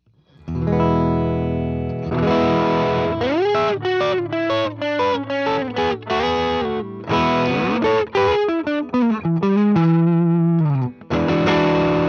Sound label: MID GAIN 808